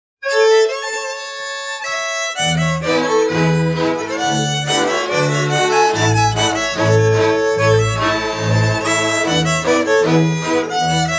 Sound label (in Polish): Krakowiak powitalny